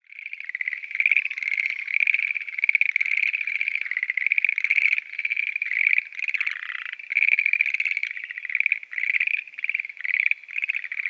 frogs croaking in pond

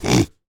pig oinking

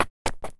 stopping suddenly on road

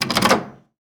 opening wooden door lock

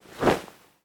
putting on coat

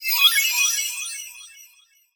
hp recovery magic

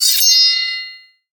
sword being drawn

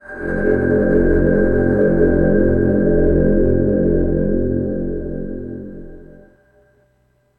dark aura

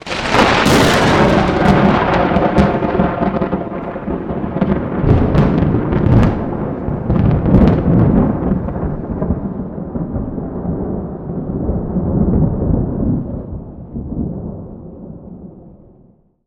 lightning strike

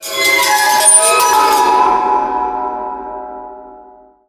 spooky, anime

